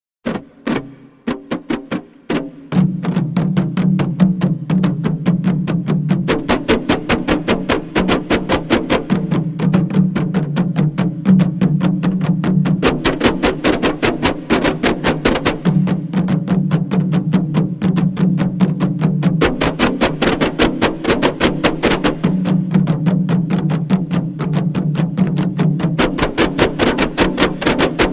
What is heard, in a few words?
Kompang Chorus